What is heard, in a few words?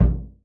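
BD SOFT, 30S BRUSH KIT; Northstar - New Gold VOL-2; 30S BRUSH KT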